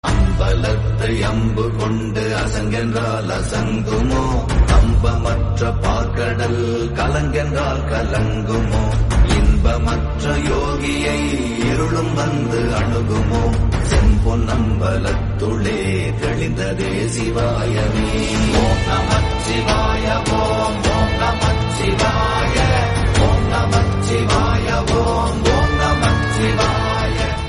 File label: devotional; Lord Shiva